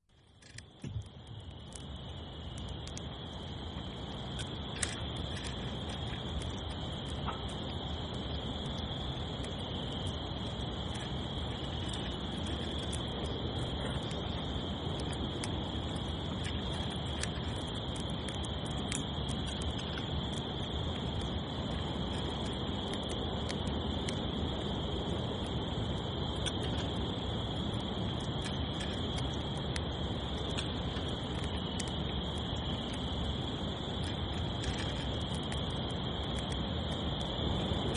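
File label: voice squirrel; belka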